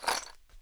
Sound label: box in; weapons; mg; garrysmod; vollmer